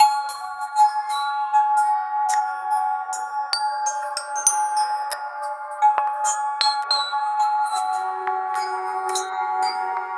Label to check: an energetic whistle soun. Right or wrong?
Right